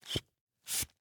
Hand bellows, leather, wood, brass, open, close, wheezy, blown air, supple, creaky; Eiravaein Works; Vaeyan IV